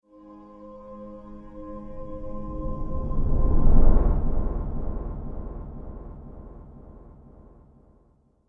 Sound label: Angelic Riser Whoosh; sound design; Whoosh Sound Effects